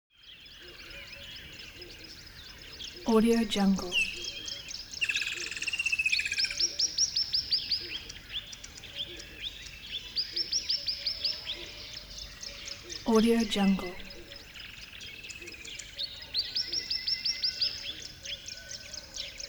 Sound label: atmo bush river bed dawn birds; smartsoundfx